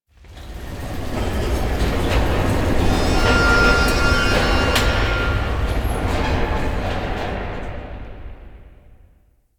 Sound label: Mining Machines, Asteroid; Ambience; Warframe; Grineer Asteroid